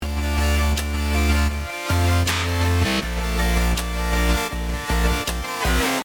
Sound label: Synth after VCA; compressor